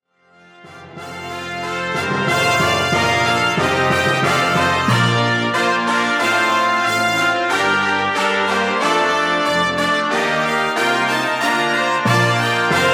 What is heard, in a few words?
brass